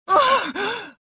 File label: choke; mothma